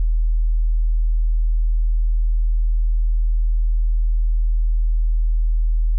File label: ambience drone